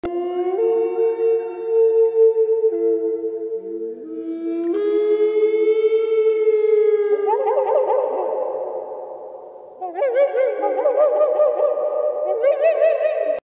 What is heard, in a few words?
Spinosaurus, غرش